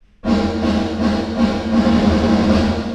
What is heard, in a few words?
LATE REVERB